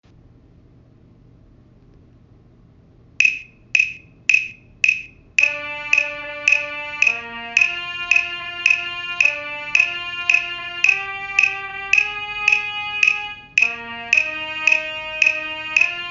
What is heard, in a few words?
tenderfoot trumpet